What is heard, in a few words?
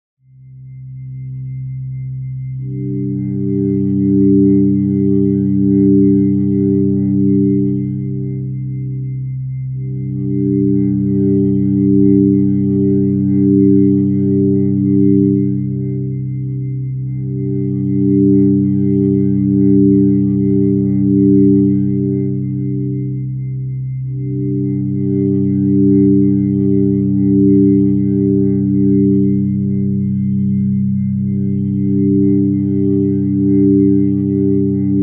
mystical dark pad